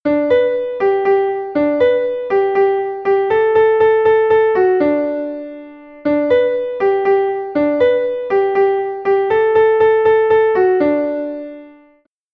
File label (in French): refrain ralenti piano; Refrain avec piano; Refrain chanté